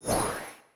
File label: hexa charge; CrossCode; battle